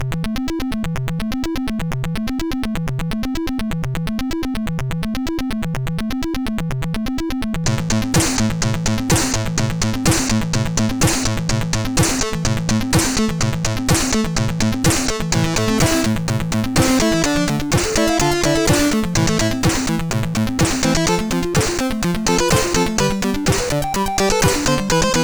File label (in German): Kurze Melodie, CSG8580R5